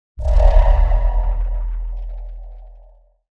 ui distort

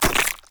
e imp vibe h

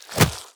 punch

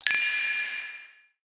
water biotechnology

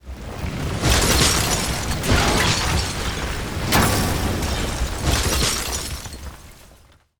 crystal rise

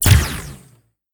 mini uav

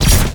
sword mark exlpode

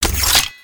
skill archet

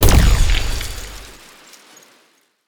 imp laser

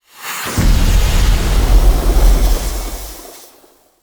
steam burst big

